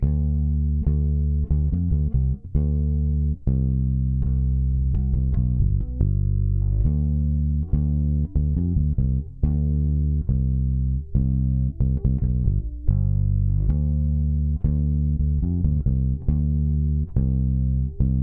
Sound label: bass